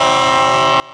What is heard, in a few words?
Leslie RS5T Short, Transport Trains Horns